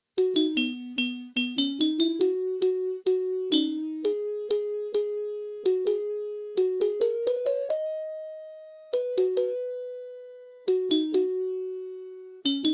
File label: dixieland, chimes; chimecollector